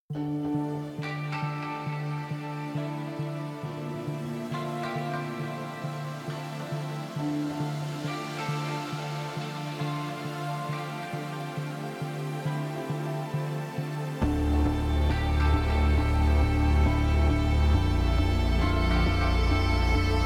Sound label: Borderless Main Full 136BPM